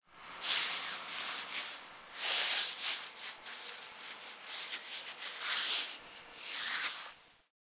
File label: Foley Interact Scratch Sleep Right; Enemies; Warframe; Catbrow